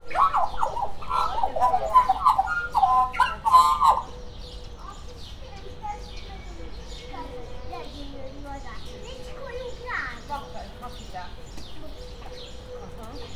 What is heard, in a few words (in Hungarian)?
latogatok erkeznek, fekete hatu fuvolazo madar; debreceni zoo 2012